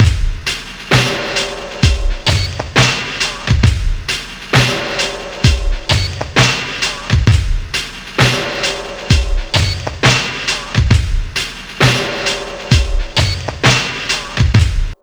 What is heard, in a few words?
ZEUS, Dark Side Of TripHop; USB Soundscan vol.35; AKAI